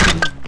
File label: undead wizard damage